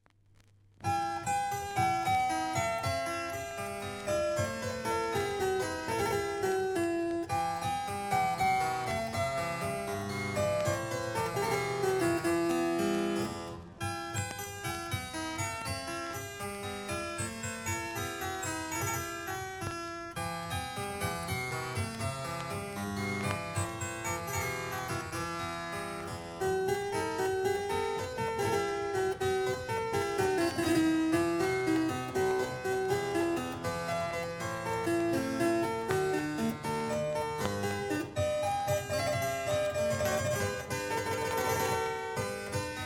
Pjeses klavesinui. Ziga ir Rondo; J. Ph. Rameau

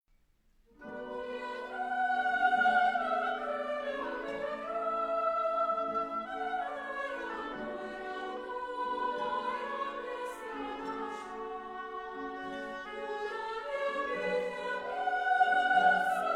Merginu choras; Borodinas

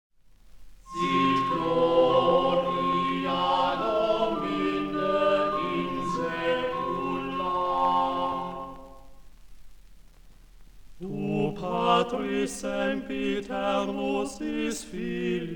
Paralelus organumas

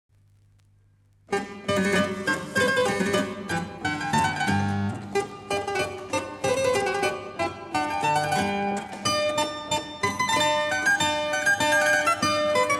klavesinui tamburinai; Fr. Couperin

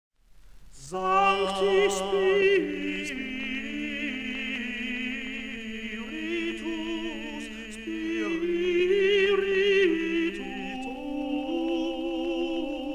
organumas; St. Martial’o mokyklos

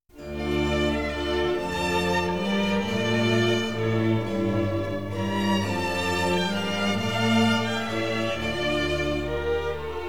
koncertas fl., styg. ork. ir basso continuo F-dur alla siciliana

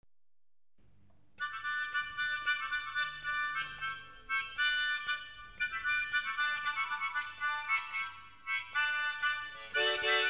Linksmoji polka

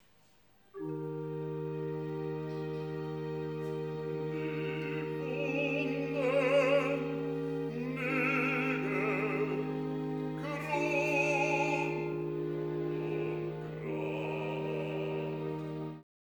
Bach Kantata nr. 78, boso recitatyvas

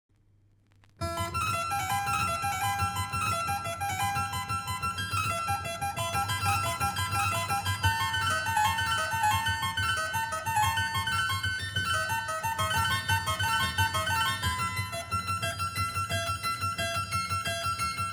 Pjeses klavesinui; J. Ph. Rameau; Pauksciu klegesys

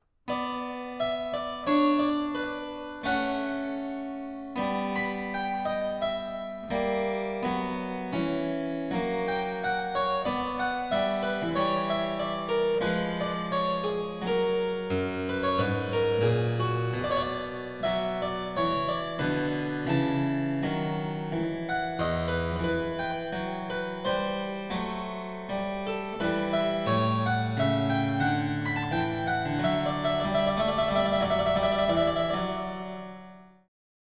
Bach Sarabanda c-moll; (Pranc. siuita nr.2)

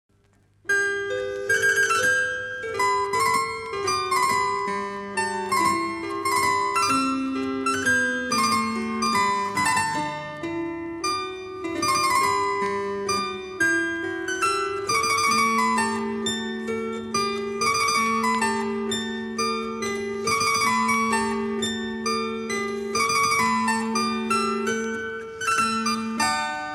Pjeses klavesinui; Fr. Couperin; Gelininke

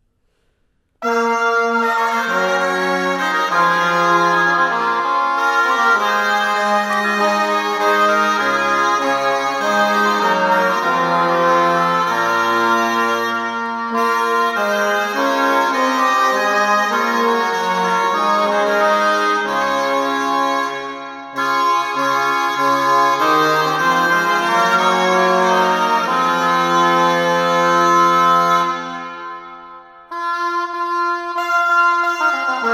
instrumentu ansambliui; H. Isakas; Maudit soyt

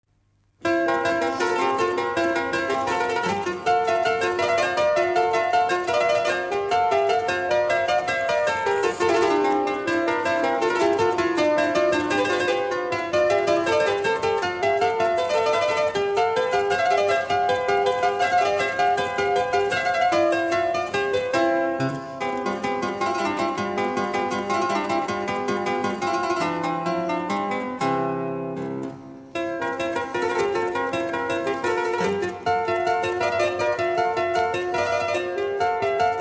Pjeses klavesinui; Fr. Couperin; Verpejos

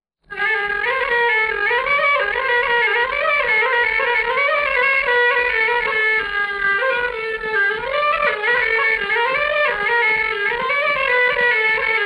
piemenu melodija

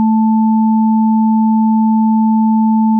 sudetinis 220 880

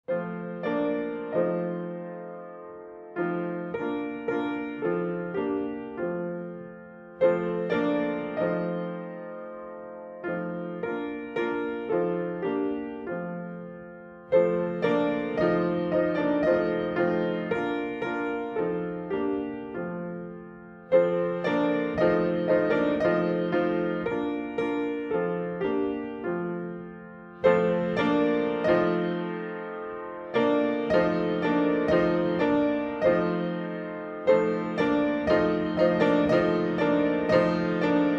Valstietis griezia armonika

